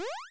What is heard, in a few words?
level Switch; SuperPangWorld